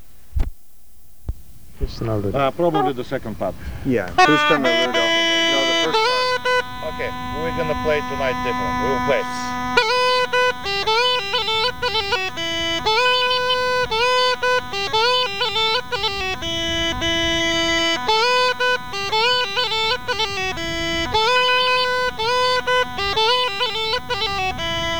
Rodope Gajada; Clean

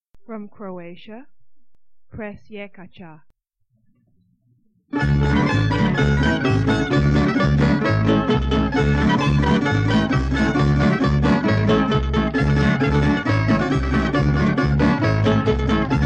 Croatia Presjekaca, Folk Dances; Petaluma; 2nd String Folk Dances; Croatian

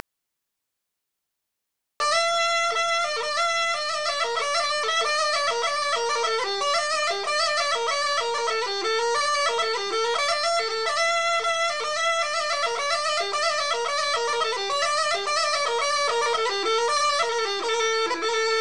Ruchenitsa Y Recapitulation, Gajda; Lesson Recordings; Vasil Bebelekov (2008) Gajda Lesson

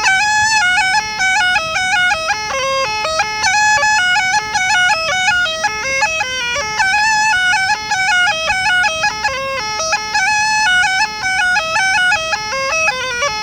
Varnenski 9 melody major, Small Gajda

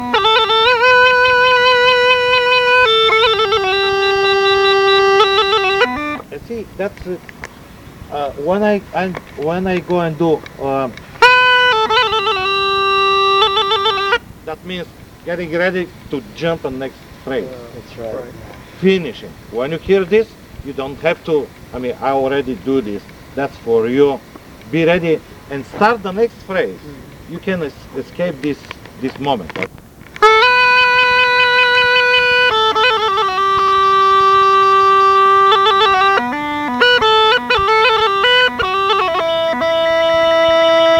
Slow Song, Rodope Gajada

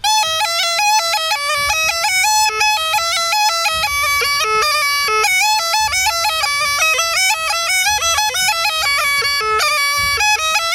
Nestinarsko Ruchenitsa, Small Gajda

